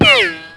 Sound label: w hit; Team Alligator